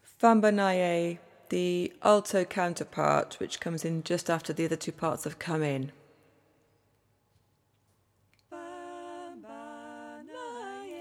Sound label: 'call' (low ending)